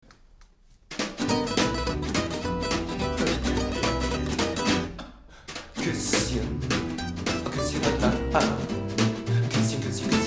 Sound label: Rock n Roll